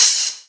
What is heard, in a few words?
Open Hihat; Vengeance Samples; VEE Cymbals